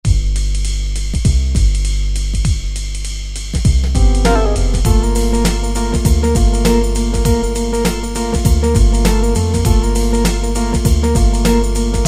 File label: Jazz Hip Hop; Preset Styles